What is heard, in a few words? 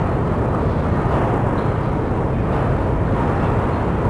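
door large move loop; movers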